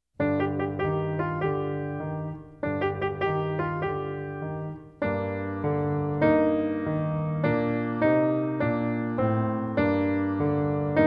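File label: When Johnny Comes Marching Home (Accomp), trumpet; The Canadian Brass Book Of Easy Trumpet Solos; Hal Leonard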